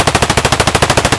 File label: mp5 outdoor distant loop; Escape from Tarkov